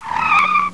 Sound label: skid